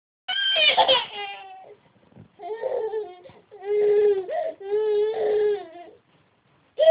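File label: baby hungry, female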